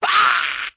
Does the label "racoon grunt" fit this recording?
Yes